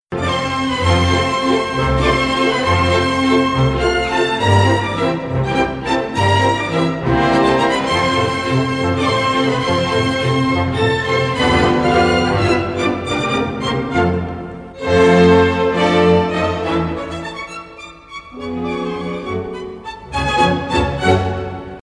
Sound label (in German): WALZ; kermis geluiden